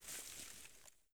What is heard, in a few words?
grass move